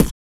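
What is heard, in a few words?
vox percussion, Vocal Planet Jazz FX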